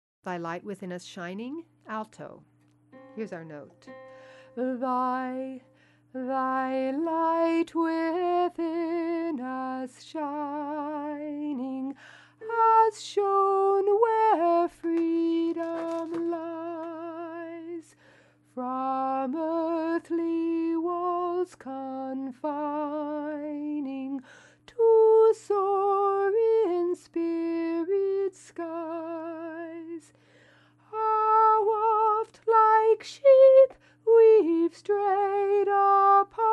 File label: alto; alto oratorio; Thy Light Within Us Shining